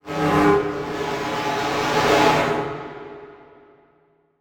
Transition Whoosh Drone; Production Element; Title Transition; AdobeCC

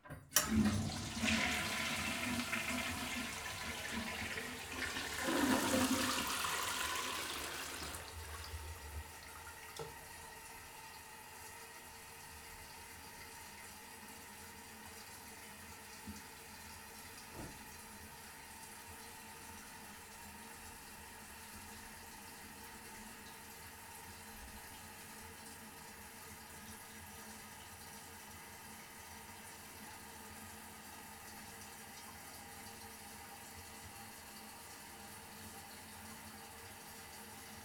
Toilet Flush Long Tank Fill; Blackmagic Fairlight

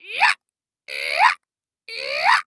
Sports Human Animal Call Bird

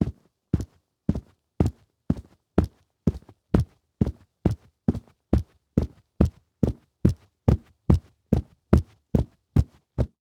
Foley Footstep Work Boots Rug Carpet Fast Walking; Foley Footsteps

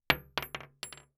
Weapon Bullet Shell 3030 Drop On Hollow Wood; AdobeCC

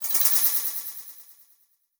Multimedia Internet CD-Rom Flash Animation

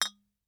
Household Beer Bottles Toast

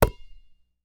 Sports Basketball Catch Single

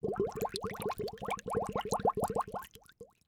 Liquid Water Bubbling From Glass Bottle

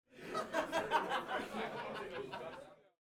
Walla - Crowd laugh and shout - Interior - Softer; Crowd Laughter; Timothy McHugh; GDC Game Audio Bundle

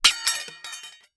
Impact Metal Spatula Hits Drop

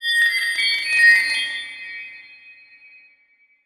Title Transition Crystal Eerie; Production Element Title Transition

